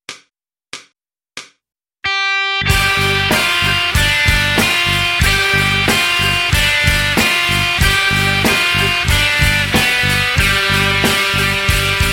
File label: guitar method